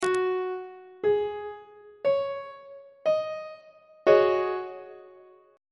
g sharp dominant 7th suspended 4th 3rd inversion on treble clef